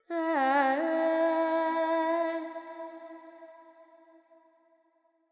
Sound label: Carnatic Female Alaps, Panthuvarali, 100 G, FX